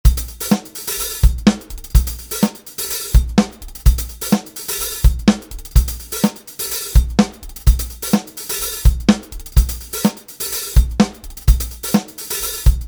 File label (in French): guitar part riff et celebre loop batterie